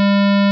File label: EEEEHT; Burning Rubber 1.2.3